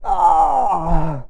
ouch

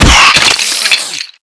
pain, skeleton

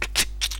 clip insert

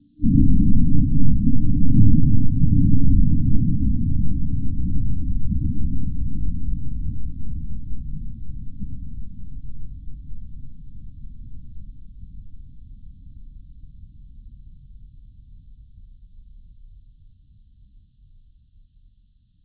mortar distant

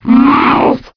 zombie poison alert

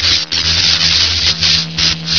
zap

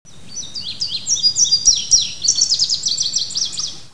bird sing

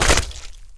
foot on mud reception

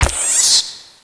rat die

